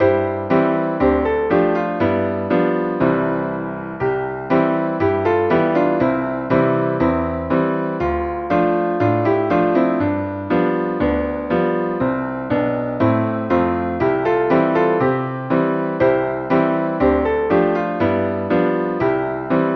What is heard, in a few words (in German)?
Klavier